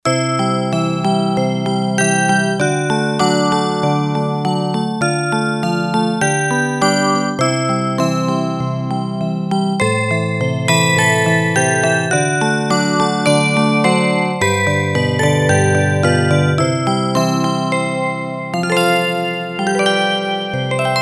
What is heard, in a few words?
Piano Bells, Yamaha TX802; Factory Patches; Voices